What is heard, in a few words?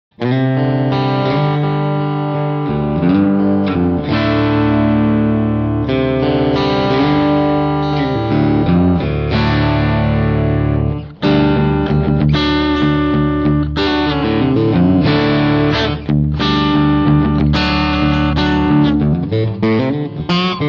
Dualtone cut Dirty, guitar